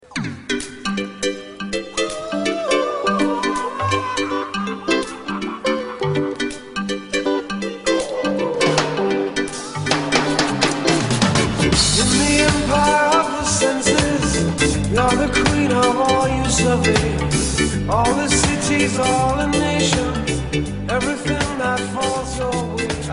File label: Love 7th Wave, yamaha dx7